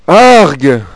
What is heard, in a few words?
pain; HALFE LIFE 3